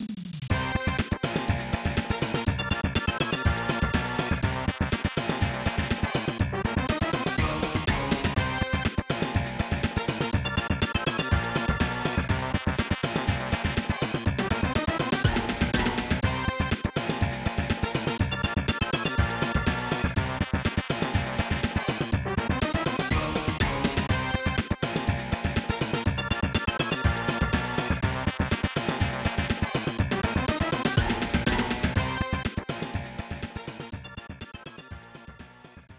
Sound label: Victory or Defeat; Lethal Enforcers; SNES